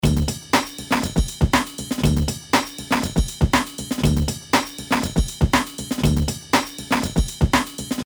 SmartComp Beat Original

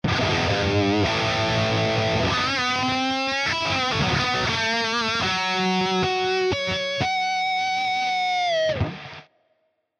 Lead Amp; Archetype Abasi

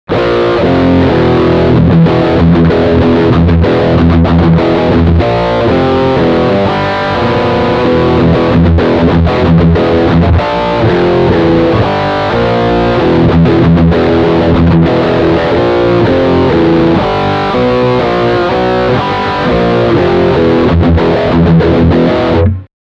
Riff Humbucker

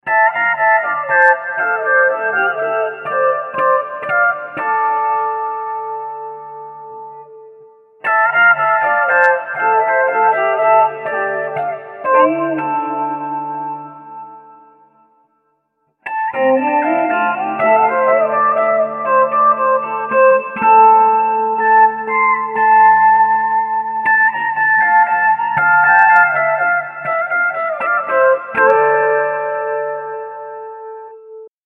Mooer GE 300

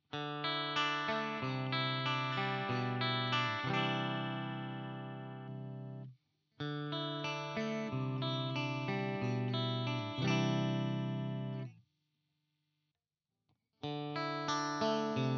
Fender Am Pro push pull CLEAN

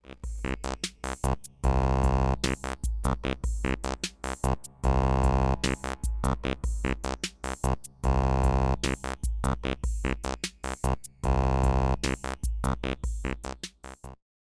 WT OSC; Rossum EM Control Forge

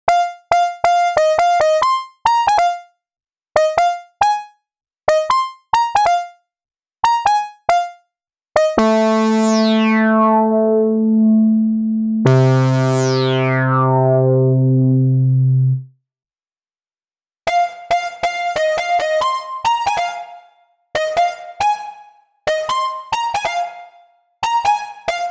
Disto Lead

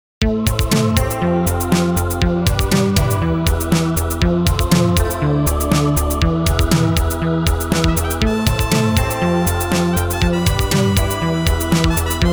80ties

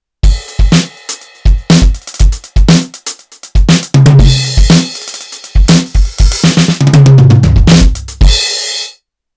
Roland TD-4KP

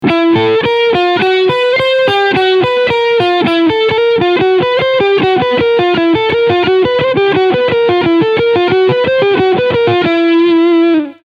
ARPEGGIOLINES

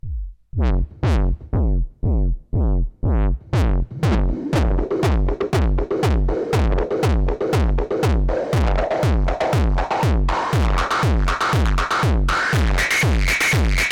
Queen Of Pentacles Distortion Filter Resonance

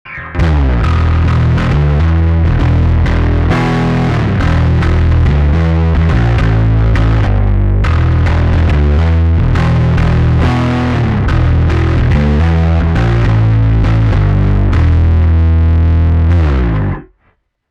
Omega mix higain growl bass treble boost

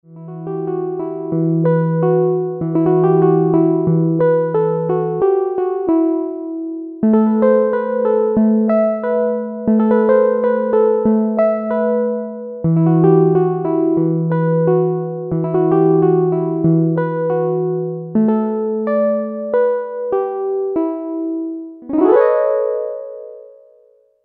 Roland JP08 Harp

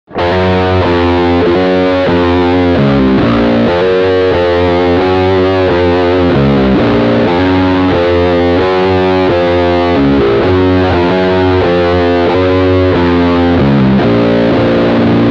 Riff five halftone

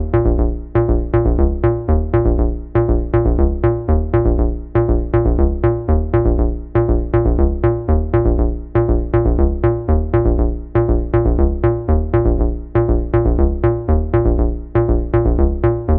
Analog Bass Original

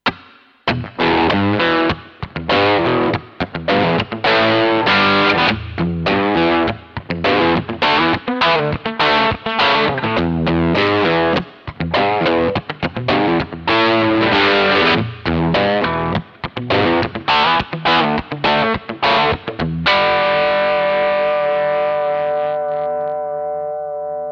Vibrato Modern

